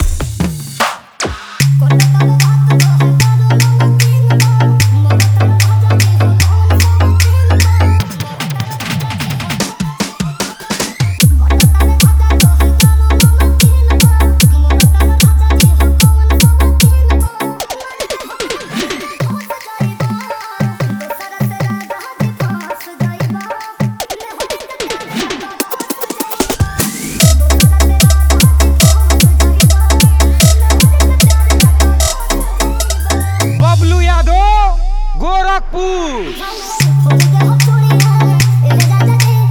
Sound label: Bhojpuri Dj Remix Songs Edm Trance Mix